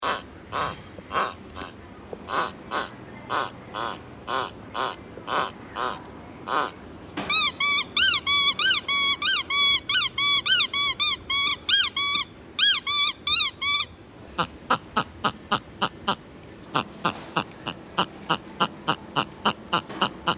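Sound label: dog whistle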